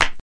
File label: clap real, drums single hits; Claps